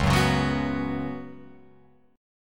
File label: Db 7th Suspended 4th, Guitar, Open F, chord; JGuitar